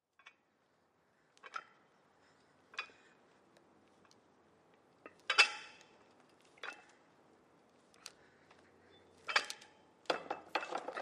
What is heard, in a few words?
Gym; Weight Movement And Strained Breaths.